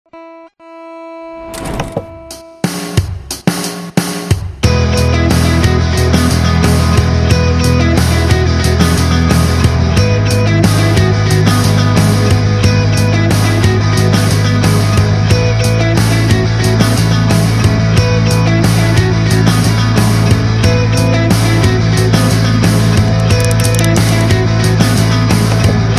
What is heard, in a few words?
joyo jam buddy 2; joyo jam buddy ii guitar amp multi effects